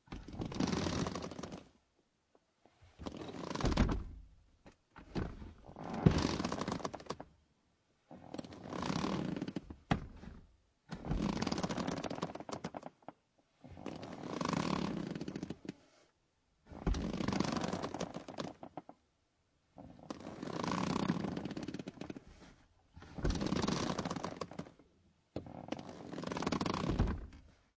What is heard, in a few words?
Transportation Automobiles, Car Interior, 1996 Acura Integra, Seat Recline, Backward And Forward, Several, No Engine